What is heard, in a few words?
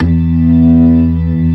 Org E3; Best Service Gigapack 2